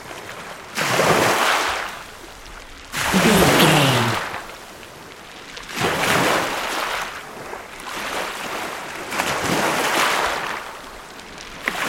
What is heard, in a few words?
beach waves ocean water strong loop